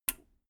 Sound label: metal button radio release power off